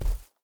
bear footstep grass hard; Rust

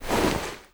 foley gear rattle walk

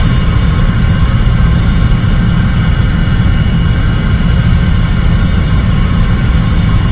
jet cockpit, engines; War Thunder

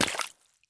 run puddle; Escape from Tarkov

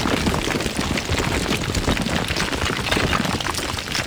stones scatter stereo loop

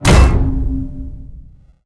biomech blocker punch hit

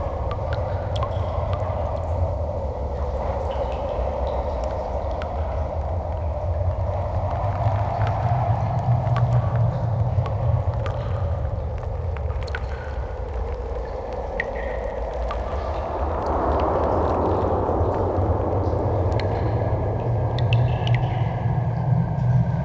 ambience cave

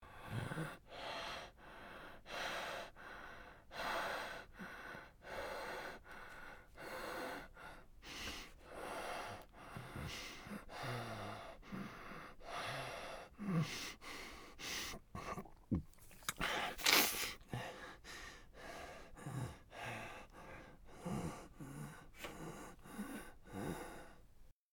breath hurt; Escape from Tarkov

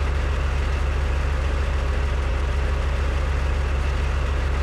chieftain engine rpm 00, tanks; War Thunder

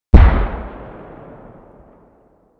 Vehicle Weapons

